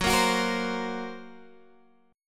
Gb Major Flat 5th, down, Guitar, Half Step Down

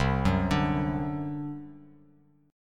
C Suspended 2nd Flat 5th C down Bass Standard; Csus2b5 arpeggiated

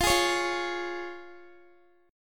F Minor Sharp 5th, down, Guitar; Fm#5; 4E,4A,5D,5G,5C,6F; strummed

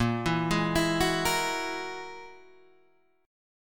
G sharp 7th Suspended 2nd Sharp 5th

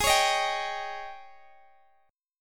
Ab Major 7th Suspended 4th, Guitar, Open G; down

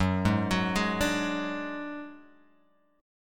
Gb Suspended 2nd Sharp 5th, Guitar, Open G; 4,1,4,1,3,x; down; chord shape

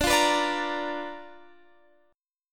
B Minor Major 7th Flat 5th D, chord

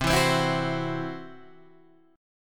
C Major Flat 5th, C, down, Guitar, Standard; strummed; CMb5

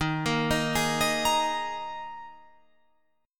Eb Suspended 4th, Guitar, Open F; Ebsus4; arpeggiated

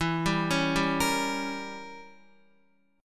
E Diminished 7th E, Guitar; arpeggiated

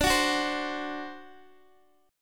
D 7th, D, down, Guitar Open D

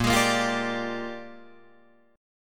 Bb 13th Bb down Guitar Drop D; Bb13 strummed

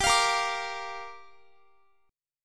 G Minor 7th, Guitar; Gm7; strummed; x,x,19,22,22,22

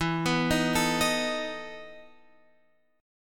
E Major 7th Suspended 4th, Guitar, Open F; x,11,11,10,12,10; arpeggiated

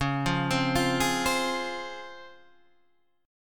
C sharp Major 7th C sharp, Guitar Standard; C#M7 arpeggiated; JGuitar

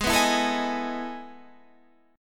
E Major 11th chord

